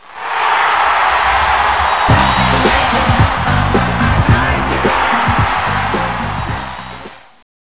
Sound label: knife cheer